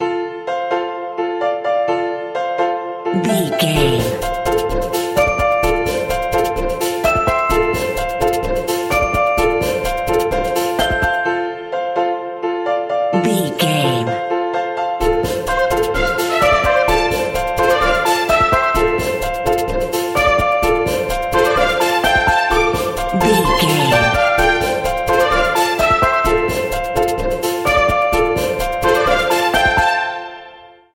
music happy bounce

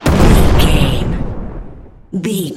explosion large

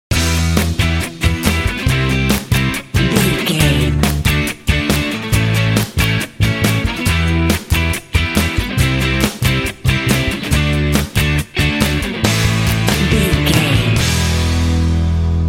indie trojans rule sting

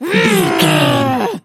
voice female growl

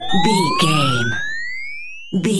power up whiz night vision goggles on

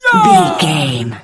voice male battle shout short

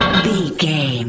manhole metal door lid impact

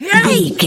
voice female attack

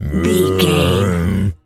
zombie voice grunt

